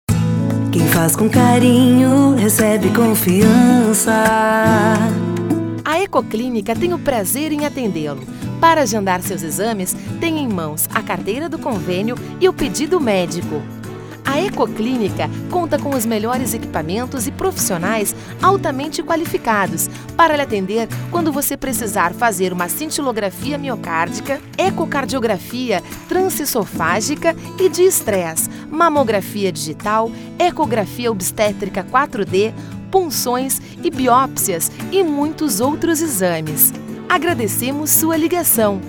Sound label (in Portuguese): Espera Telefonica; Ecoclinica e Tomoclinica